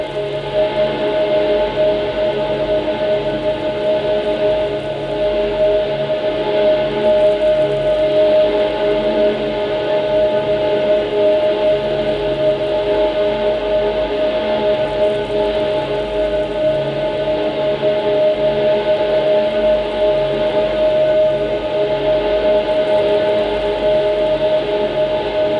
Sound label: celestial atonal atmospheres; paragon